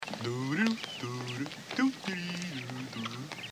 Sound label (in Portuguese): cantando